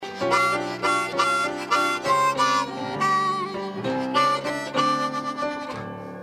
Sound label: Tongue Slap, harmonica